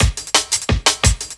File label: LOOP, Drum & Bass, 174 BPM